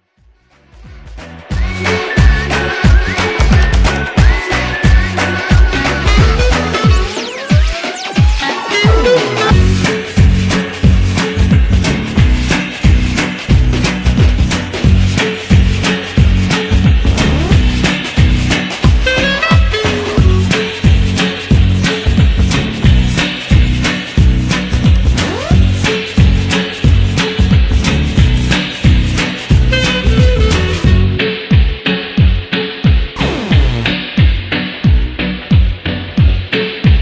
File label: Swing Mambo